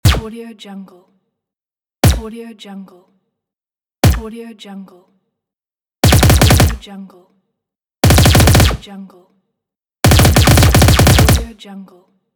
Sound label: space gun